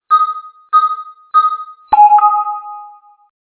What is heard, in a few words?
roadster start success